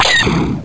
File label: shoot; svencoop; imp